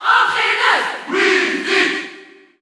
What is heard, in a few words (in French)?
Female Cheer French; Wii Fit Trainer; SSBU